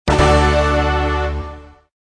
win

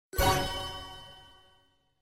win star

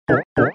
sound miss

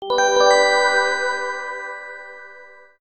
win

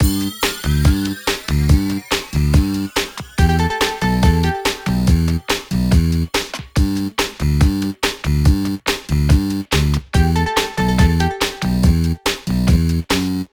music

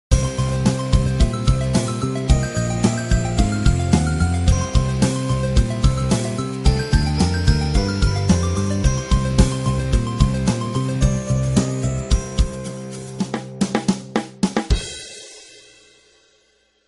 win theme; jinhuashi2048

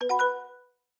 new level unlocked; dapopinata

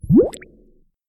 undo; game; jellyslice

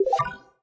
unlock; zhipai

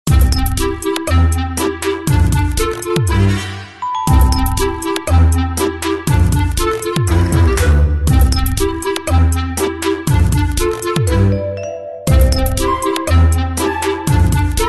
soundtrack

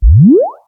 alien go

